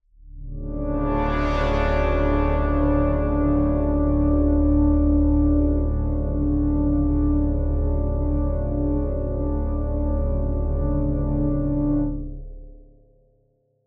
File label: One Shot Drone D, dystopian drone; One-Shot Drones